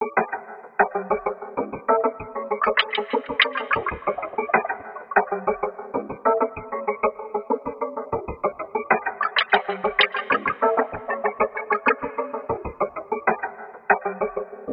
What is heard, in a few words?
META GROOVE; eShop